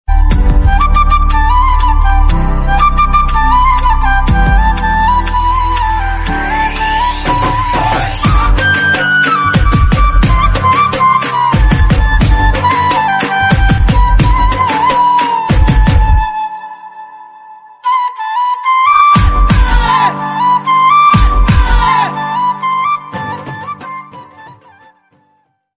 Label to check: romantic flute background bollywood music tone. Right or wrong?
Right